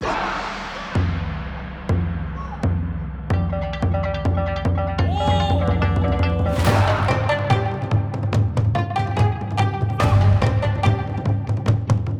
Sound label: Blood Rain; Twilight Monk